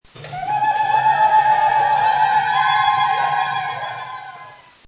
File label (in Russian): ululukanie